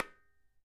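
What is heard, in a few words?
KHOL HEAD, DRUMS OF IND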